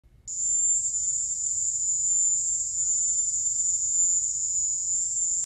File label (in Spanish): sonido cuba